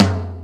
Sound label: JV KIT; Pro-Rec - Synthsonic; JV ANALOG2